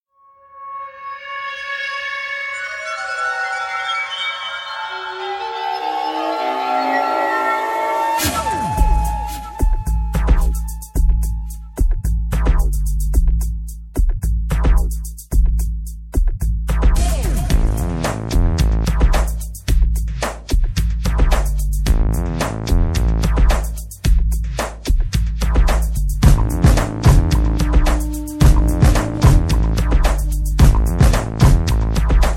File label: instrumental